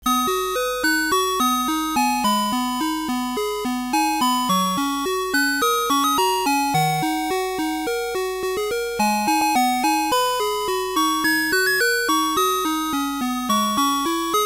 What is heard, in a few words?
Chord A